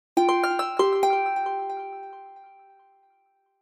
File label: Message, notifications